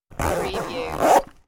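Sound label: Sm Bag Zipper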